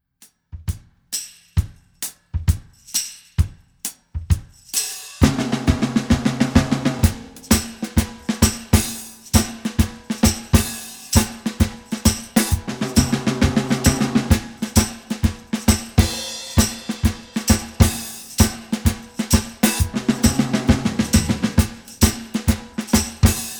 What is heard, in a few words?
132 bpm